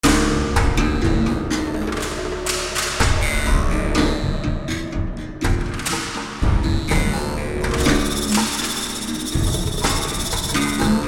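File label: Battle Loop Rural Percussion; Fallout New Vegas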